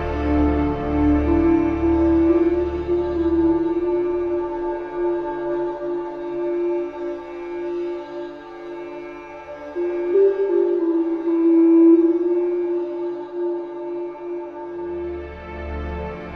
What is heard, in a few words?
flute and strings failures, Loops, Sad